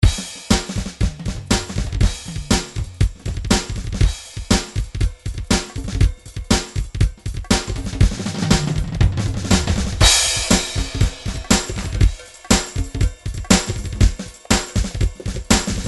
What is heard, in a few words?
drum grooves midi loops rock; Steinberg Nice Beats 1000